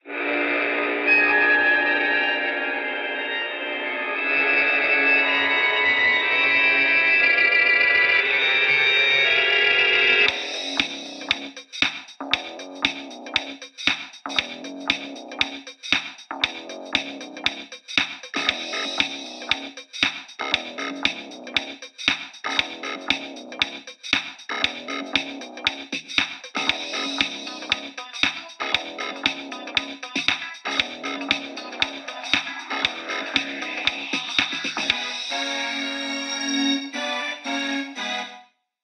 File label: Halloween Music Lo Fi; Prison Architect